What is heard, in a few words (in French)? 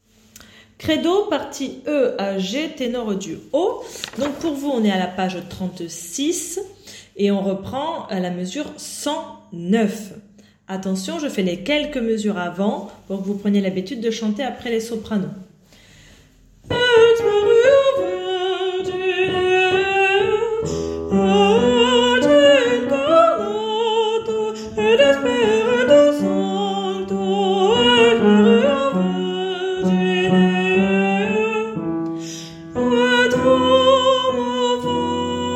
partie tenor du haut; Credo E à G